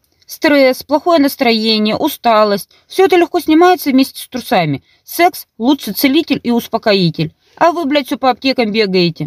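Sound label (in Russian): стресс плохое настроение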